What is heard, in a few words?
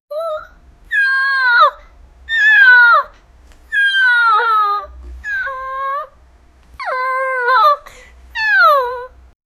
young girl sound